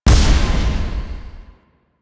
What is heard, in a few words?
Lotus Hand Projectile Die; Warframe; Weapons; Duviri